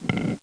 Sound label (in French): Tomber; BeOS; ababelone